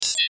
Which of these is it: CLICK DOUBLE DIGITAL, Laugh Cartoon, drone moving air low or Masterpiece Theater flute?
CLICK DOUBLE DIGITAL